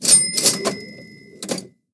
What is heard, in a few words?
new cash